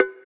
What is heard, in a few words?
Cowbell; Equipped Music; Loungin House